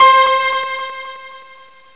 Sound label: PIANO echo; PT Support archive